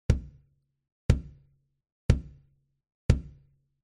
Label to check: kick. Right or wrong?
Right